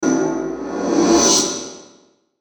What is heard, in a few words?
Hit To Whoosh Feedback Reverb; Whooshes; Blastwave